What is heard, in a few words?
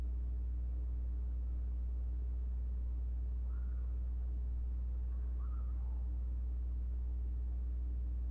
classroom whispy; Electronic Voice Phenomenon; statehouse